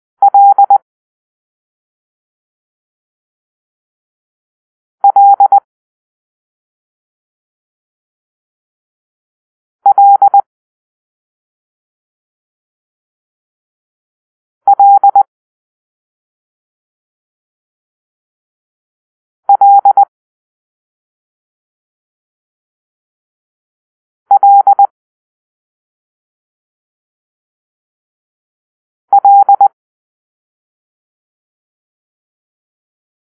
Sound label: Lima (L), morse code